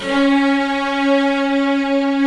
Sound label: CELLOS; AKAI S-Series CD-ROM Sound Library VOL-2